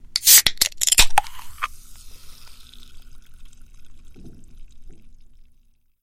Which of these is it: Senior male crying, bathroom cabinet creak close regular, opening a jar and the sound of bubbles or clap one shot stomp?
opening a jar and the sound of bubbles